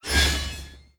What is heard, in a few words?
howl; structlife